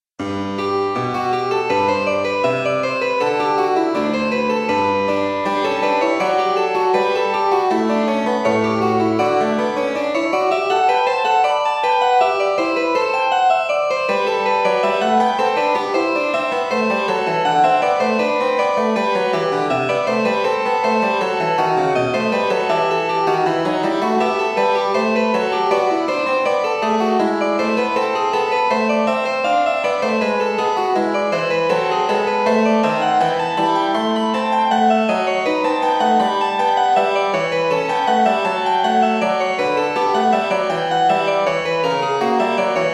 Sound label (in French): clavecin; bach johann sebastian